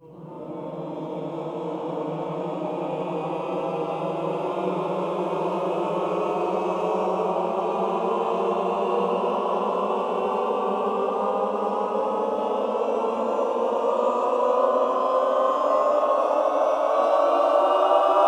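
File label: Cluster Glissando Up, Classical Choir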